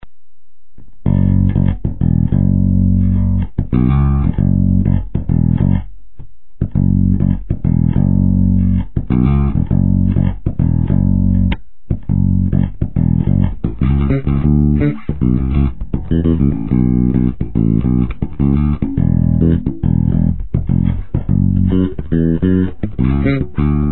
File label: trb groove